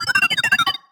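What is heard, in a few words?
Sci-Fi Droid Robot Emote Beeps; JASEM: Just Another Shooter with Electronic Music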